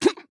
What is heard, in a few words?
Death; Atlas; Paladins